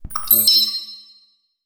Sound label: tripmine attach confirm beeps; weapons; Half Life Alyx